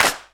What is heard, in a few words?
original hand clap sample f sharp key